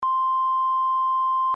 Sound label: Test of Emergency Broadcast System Loop